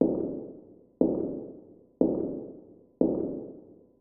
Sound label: foot